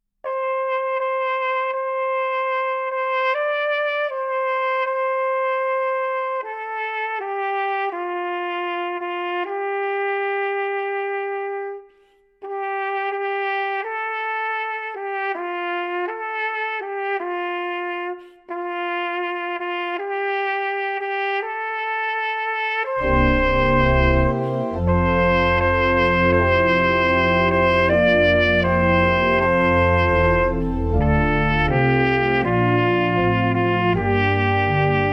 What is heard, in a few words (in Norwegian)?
vitae lux trompet